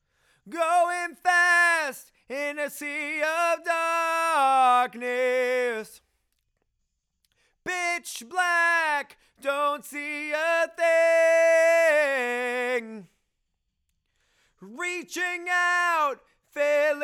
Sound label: Chorus